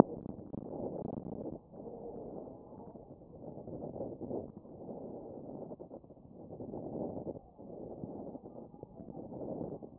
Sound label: chest